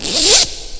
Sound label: Electro FX; Ueb HE Electro FX